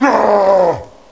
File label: die; zombie